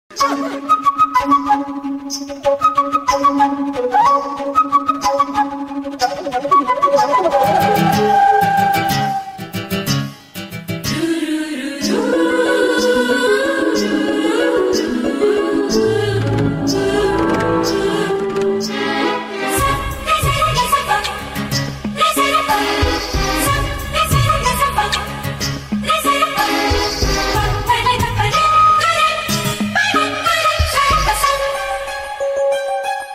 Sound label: instrumental tone